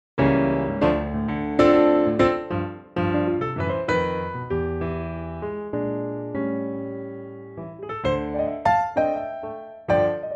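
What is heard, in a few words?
A minor piano track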